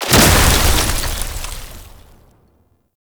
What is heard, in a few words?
Alchemy Grenade Explosion Cold; Warframe; Entrati